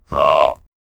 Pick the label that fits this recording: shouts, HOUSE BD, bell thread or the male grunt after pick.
the male grunt after pick